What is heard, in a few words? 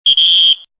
sound whistle; open toontown resources